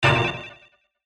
ALIEN TECHNOLOGY BURST, Sci, Fi, Scanner, Beam, Short; Loop, Science, Fiction; Multimedia; Sound Effects:Science Fiction